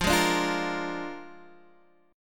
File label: A sharp 7th F, Guitar